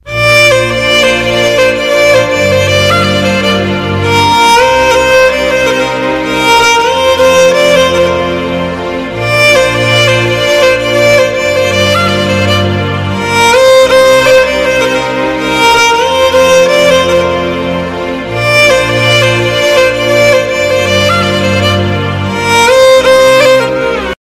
romantic music ringtone; bollywood ringtones

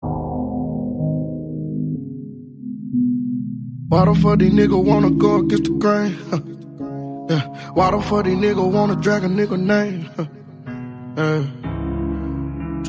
english song ringtone

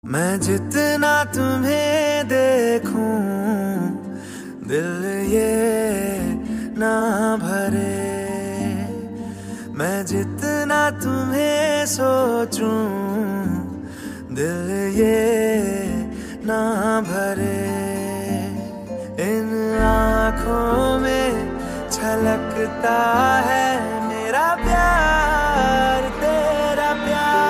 boolywood ringtone

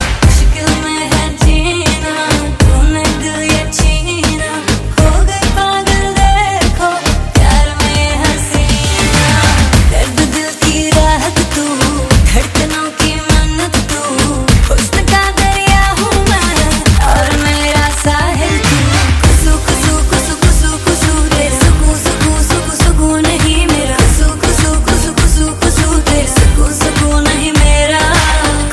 hindi ringtone, bollywood ringtones

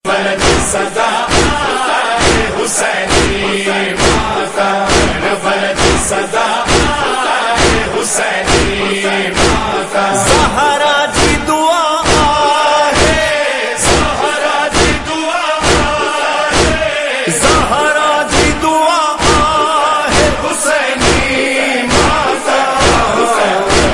noha hussaini matam ringtone

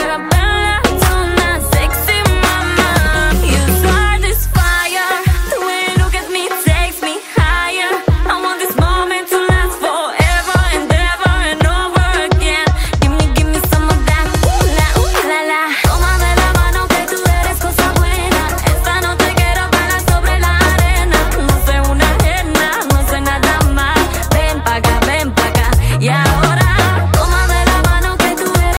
english music ringtone

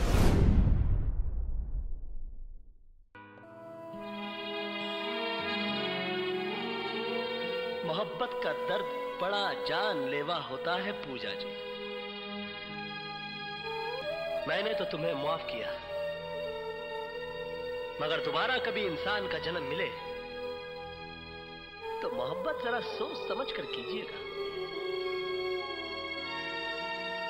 bollywood music ringtone

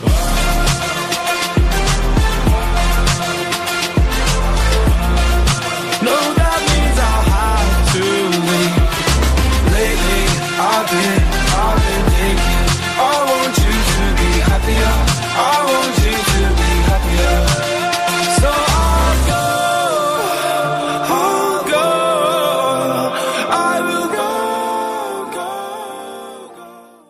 dj remix english ringtone; english ringtones

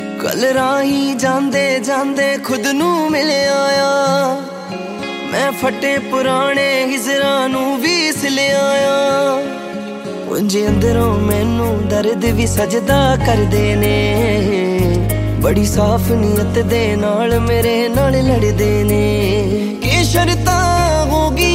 new punjabi ringtone

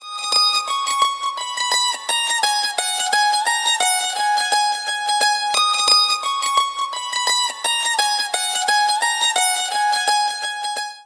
ring ringtone

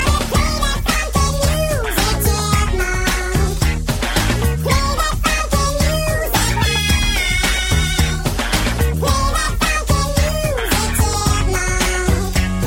funky music ringtone

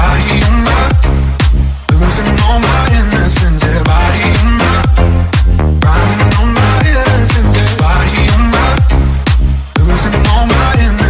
hot electro ringtone

